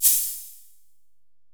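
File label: 808 maracas; Wizoo - Platinum 24 Electronic; 480L SP 808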